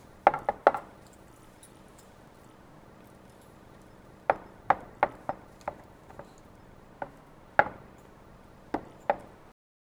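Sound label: wood blocks stacking hammering, woodworking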